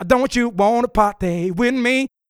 Vocal Don't You Want To Party With Me; pitchglitch

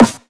Snare DnB Soft; Dabromusic

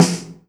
Snare Soft, Acoustic; Colombo Acoustic

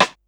alt rimshot

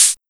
Hi Hat Open; Synthie V1

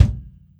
Kick Trash

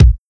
Kick Hip Hop

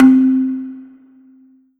metallic

